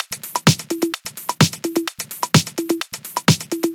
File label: House Loop, 128 BPM; Vengeance Samples